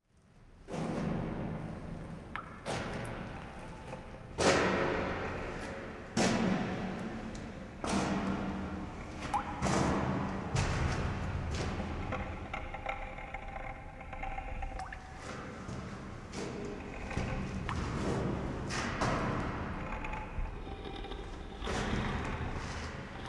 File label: footsteps water drops dragging items ambience; sonniss gdc